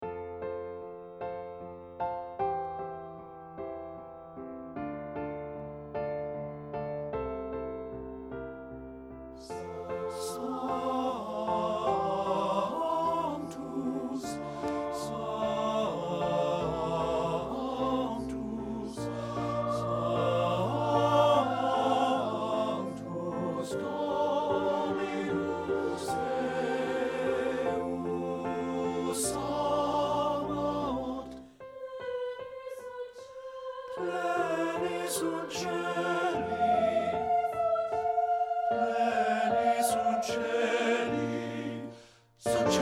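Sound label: SANCTUS TENOR; satb; partbypart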